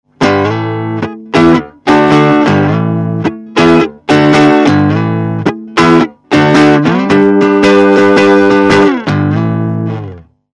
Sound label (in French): tele crunch manche vol7; fender champion600